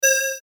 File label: Beep Long Reverb